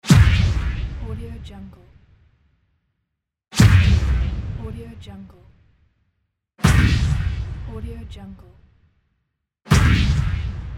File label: sci fi hit; transitions and movement